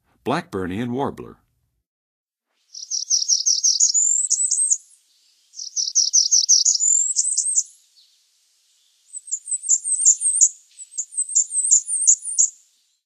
Blackburnian Warbler, Songs of Birds of North America; Western USA; Western USA Songbirds